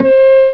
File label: Pluk Flut; Prince of Persia